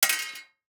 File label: DICE on Metal, Throw and Roll, Mini, One Die; Samples; ArticulatedSounds; DICE Sfx Party (Extended)